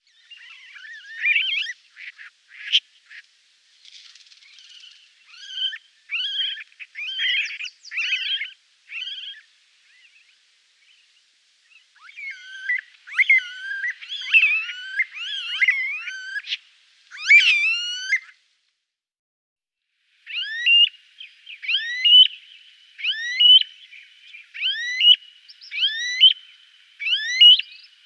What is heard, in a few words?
Ani bec lisse Smothed Billed Ani; Guadeloupe; chants des oiseaux